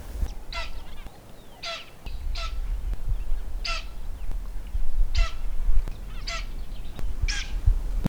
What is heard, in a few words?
Oreotragus oreotragus alarm